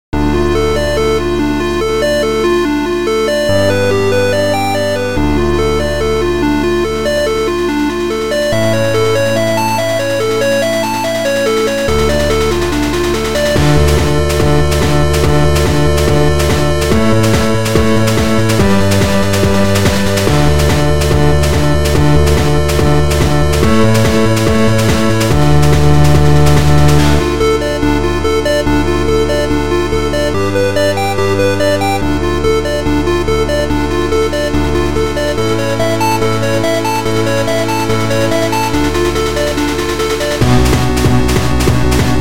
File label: Just some random chiptune